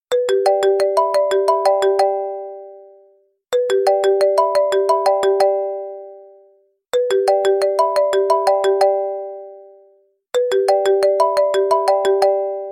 iPhone 3GS Ringer

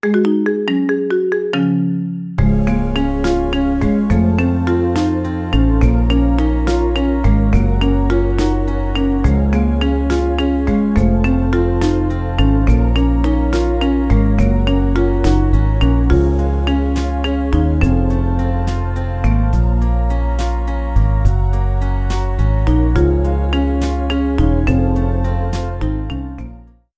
iphone marimba

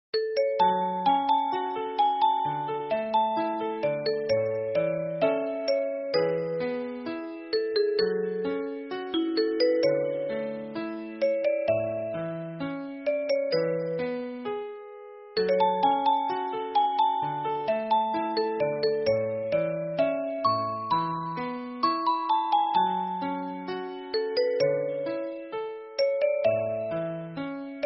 bao thuc

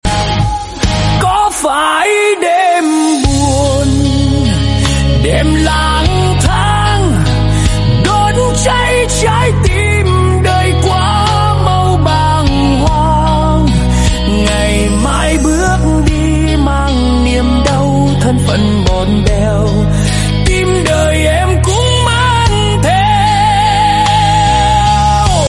rock

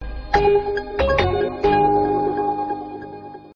samsung galaxy sms tone; samsung galaxy s24 plus

